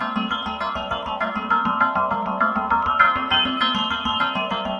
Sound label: 100 bell diversion; melodic loops; synth and electronic loops; elab